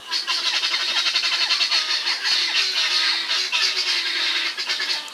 Birds Various

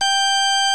60's Organ

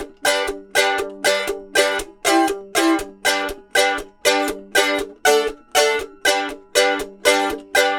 Mandolin Chuffs; Appalachian; Continental Drift Aftershocks

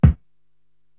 BD Muffled, Korg TR-Rack

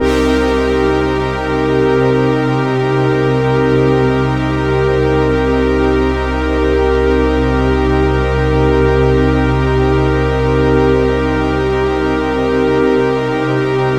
BRASSY MIN, Synths

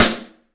STEEL S, drums; 3D Sound

